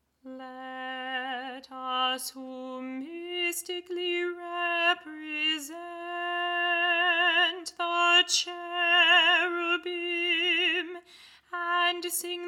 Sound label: Cherubic Hymn Alto